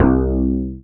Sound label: BAS FM DX-7